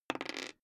die roll table

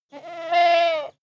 goat baa short male

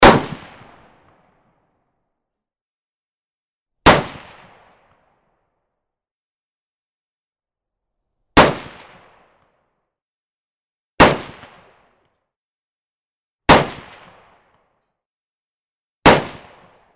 44 caliber handgun shots

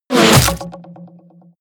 ballista hit

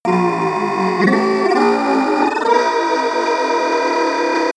monster glitch growl

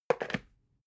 water boiler put down

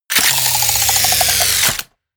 wind down

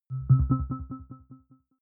button echo resonance arpeggio

